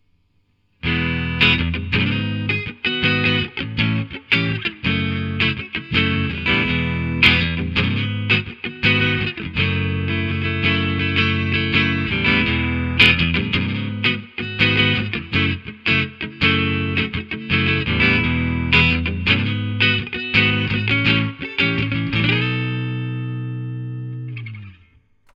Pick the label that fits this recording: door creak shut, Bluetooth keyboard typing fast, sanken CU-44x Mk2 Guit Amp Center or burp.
sanken CU-44x Mk2 Guit Amp Center